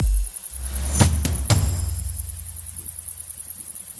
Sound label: fill pop, drum loop